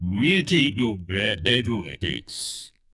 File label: jingle for an innovative; appetizing